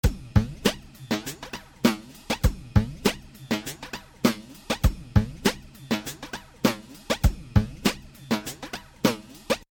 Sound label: drum loop Fast Metallic